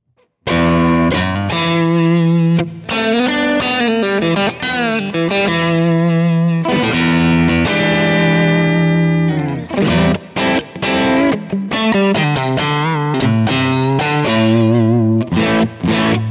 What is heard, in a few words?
Danelectro 59M NOS Crunch Neck PU